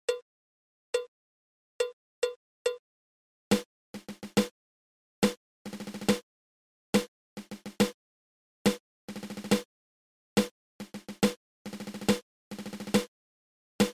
Seven Stroke Roll Triple

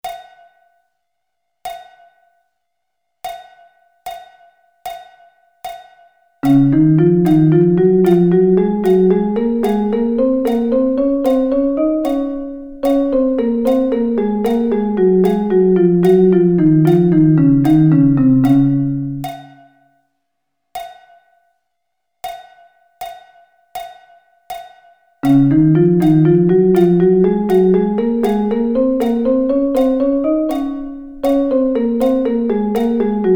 Db Double Sticking

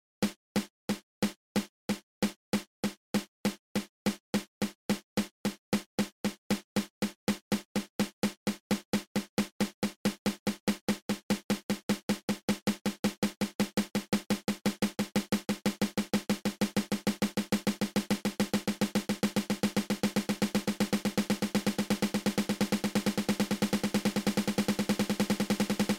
Triple Stroke Roll open close open

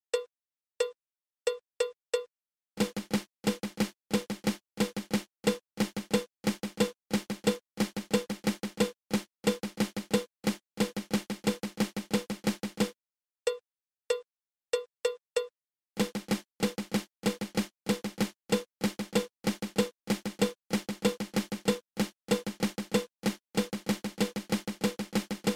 Flam Tap

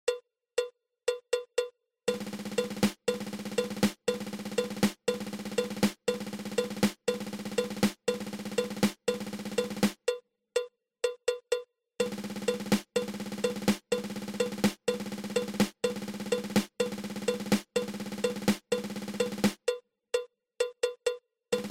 Thirteen Stroke Roll

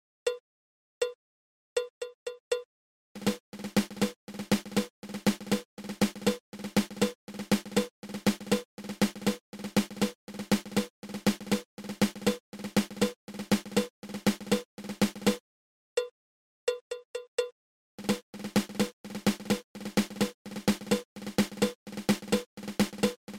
Double Drag Tap

